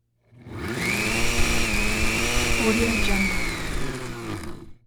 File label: blender with whipped cream; prosoundfx